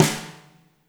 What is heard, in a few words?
WRM SNR, WARM+FAT KIT; Spectrasonics - Burning Grooves CD2